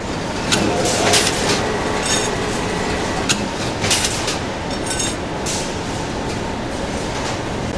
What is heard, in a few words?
Machine; Garfield Kart